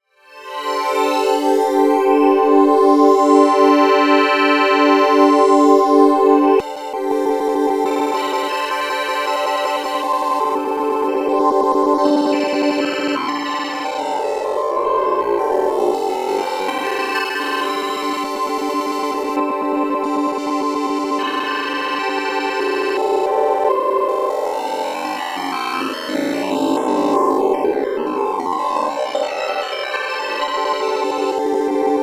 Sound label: Korg Lush Chord; Rerun